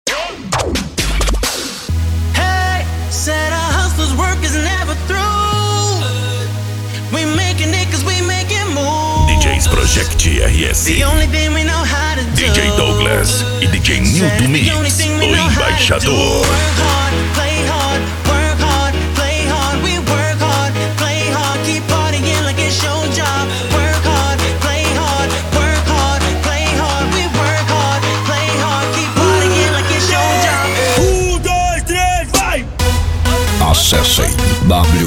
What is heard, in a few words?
mega funk remix